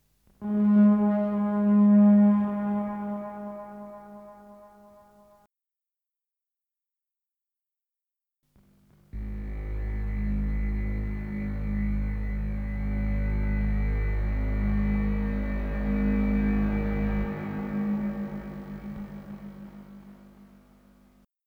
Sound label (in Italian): Suono campionato velocita normale e rallentata